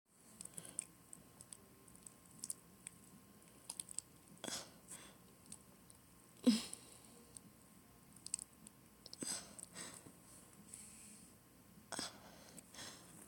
Breathy, Gasping, Panting, Whimpering, Moaning, Early morning fun, Morning nois; F4M; Wet sounds; Trying to stay quiet; solo; F4A